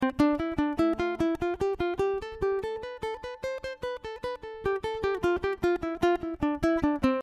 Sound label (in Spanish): C Escala Tresillo Back